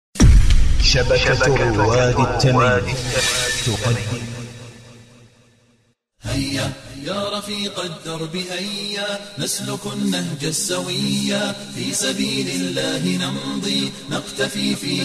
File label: Vocals Only, nasheeds